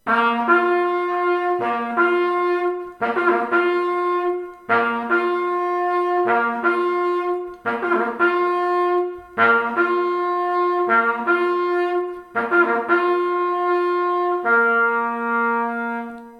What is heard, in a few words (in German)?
sammeln der treiber, jagd